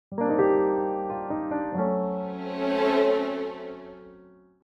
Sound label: Mobile game piano strings fail lose